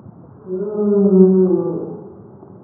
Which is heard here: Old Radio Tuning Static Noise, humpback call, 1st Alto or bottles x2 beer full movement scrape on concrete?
humpback call